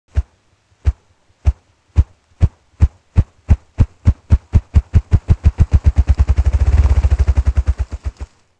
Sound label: Centrocercus urophasianus; Chordata; LandBirds